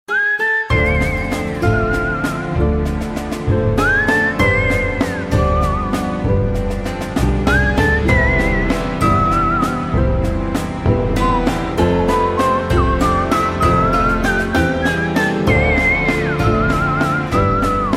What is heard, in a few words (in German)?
Pfeifen